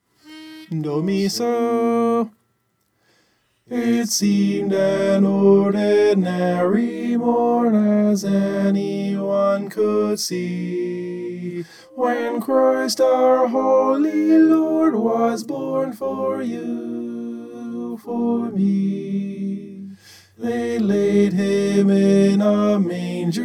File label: tenor